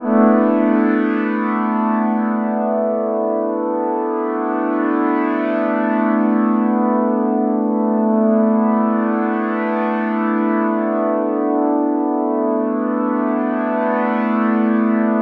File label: drone; ゆめ2っき